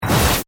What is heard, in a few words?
Static Burst Short, Static, Burst, Stutter; Production Element, Imaging Element; Accent, Transition; Static Sound Effects; BlastWave